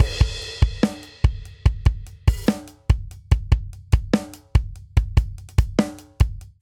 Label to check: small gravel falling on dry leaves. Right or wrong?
Wrong